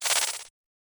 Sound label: object